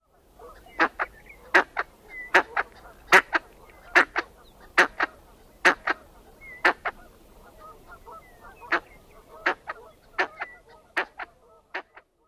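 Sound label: female harsh chuck-ka calls, bird; SK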